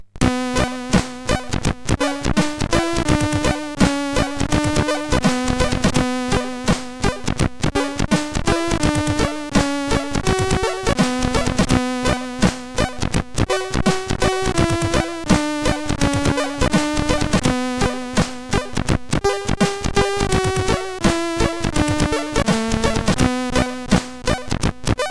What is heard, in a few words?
Consummation, sid CSG8580R5